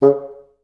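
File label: staccato C2, Advanced Orchestra; Best Service; Peter Siedlaczek's Advanced Orchestra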